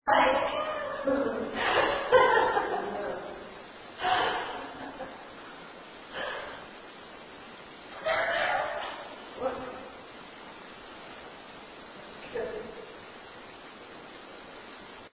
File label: museum noise laugh